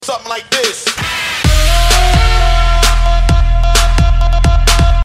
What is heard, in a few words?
BASS TONES